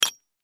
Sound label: Impact Brick Hit With Hammer; Hammers (Sound Effects:Tools); Blastwave